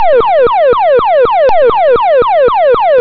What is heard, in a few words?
ALARM; Der Grobe Grafik Sound Trickkasten